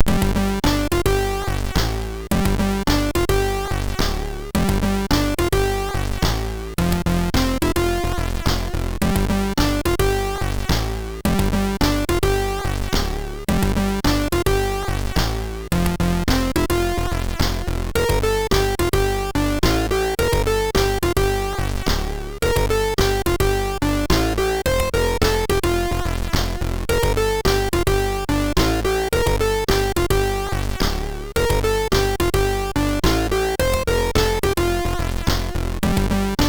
Lights On, MOS6581R3

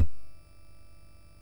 sid MOS6581R3; Toobin

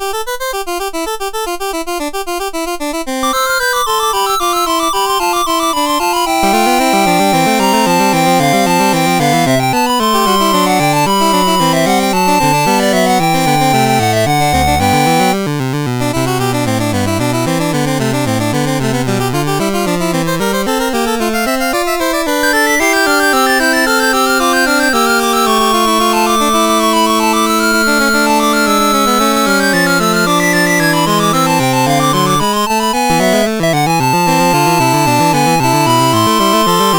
Fugue in C Major, MOS6581R3

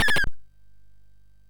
sid MOS6581R2

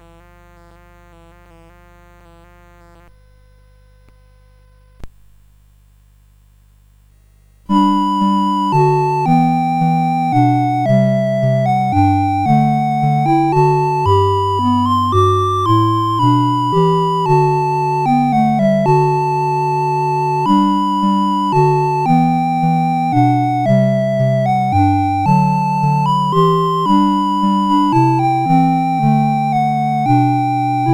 CSG8580; CSG8580R5

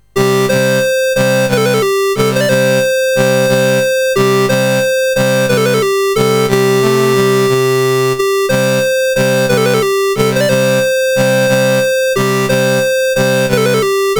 Somalia, National Anthems, CSG 8580; CSG8580R5

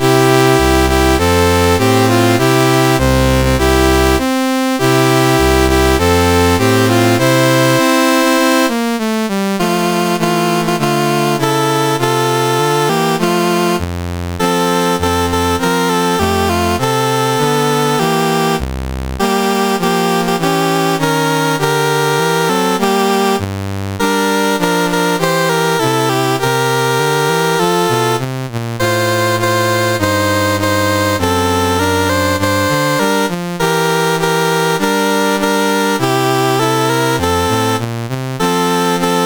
CSG8580R5, National Anthems; Niger

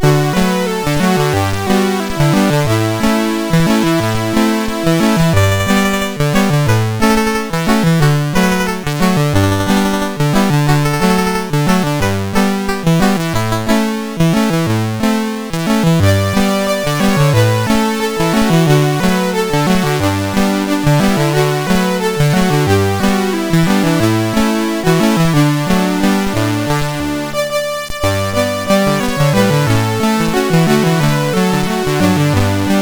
MOS6581; MOS6581R4

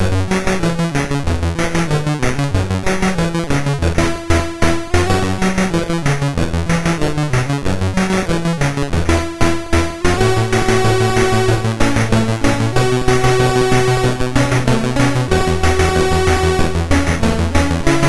Commodore 64 Music, MOS6581R2

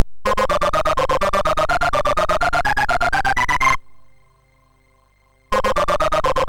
MOS6581R3, sid; Omidar

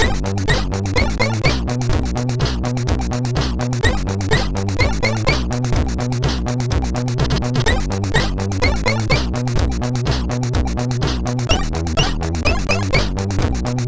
Highjump, sid MOS6581R4

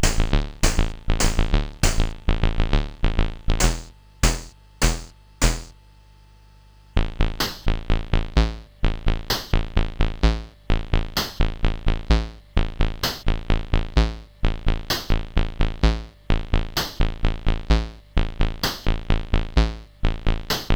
Drum, CSG8580R5

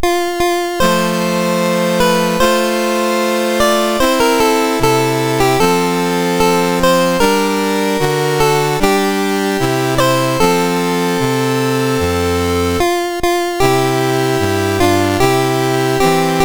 MOS6581R4, National Anthems; Maldives Republic

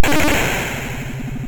Die Alien Slime, MOS6581R2

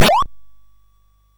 sid CSG8580R5

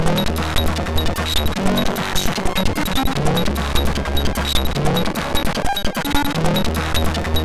Geister, MOS6581R3

